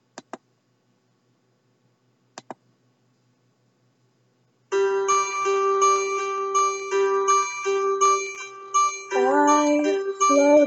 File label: Singing Depression; Heathers The Musical